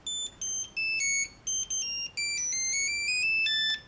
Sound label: nokia 3210 ringtone; fusion